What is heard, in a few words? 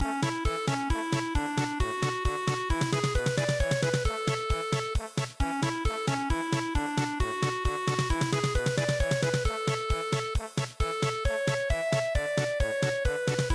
polka